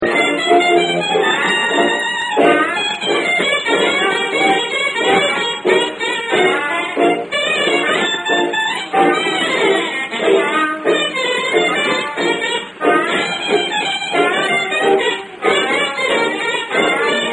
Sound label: fiddle solo; satchmo